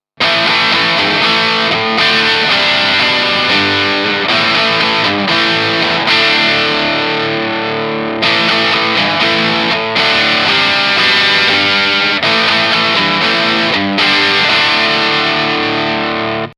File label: Two Notes Torpedo Captor dist Direct out 2x12 voice 30 blue ribbon 101